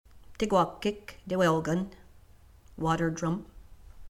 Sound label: WATER DRUM; Language Audio; MedicineCulturalThings